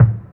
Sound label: FILT KICK; East West; Phat & Phunky